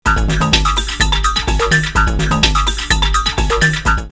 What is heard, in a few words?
Rave drumloops 126; X-Static Goldmine; 90 s Sample CDs